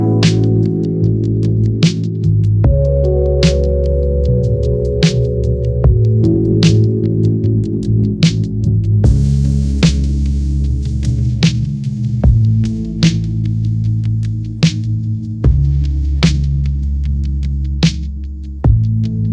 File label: ringtone lofi